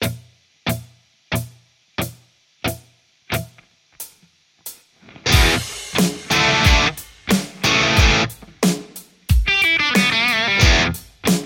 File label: tele JCM800 hot; mercuriall